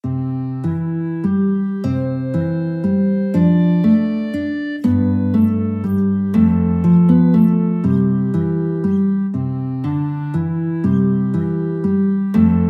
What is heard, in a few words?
morning has broken, guitar clarinet duet